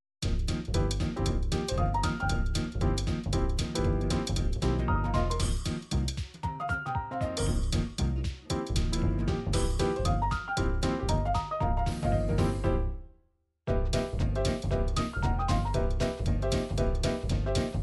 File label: swing 2 beat swing